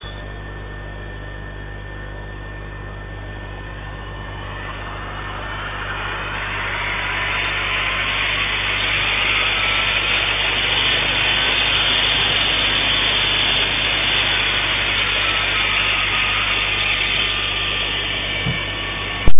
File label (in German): heli